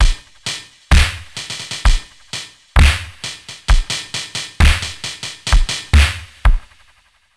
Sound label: Octave Down Loop 130BPM, DrumLoop Ghost Layers